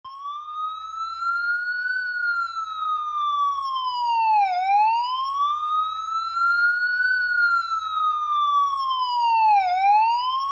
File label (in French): sirene americaine son